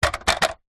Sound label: foley plastic object light small drop; zapsplat